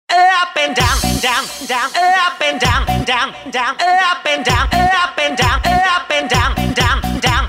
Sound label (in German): Jingels